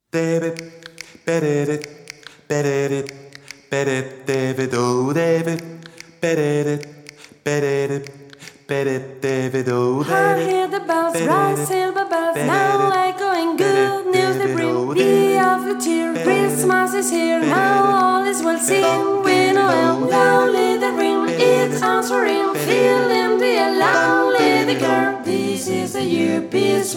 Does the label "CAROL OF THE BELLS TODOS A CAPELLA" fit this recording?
Yes